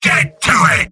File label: battle cry; vo; sniper; tf2; mvm